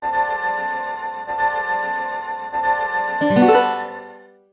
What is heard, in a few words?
Save Menu Save Yes Mono